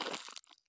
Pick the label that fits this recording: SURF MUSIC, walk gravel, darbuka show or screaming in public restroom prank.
walk gravel